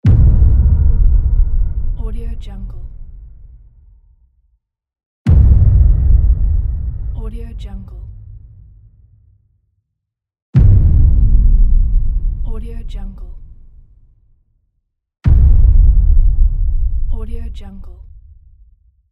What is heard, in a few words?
subwoofer accent